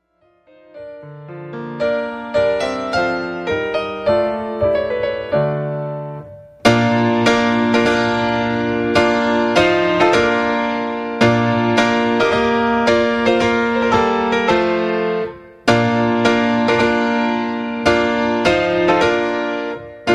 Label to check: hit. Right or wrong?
Wrong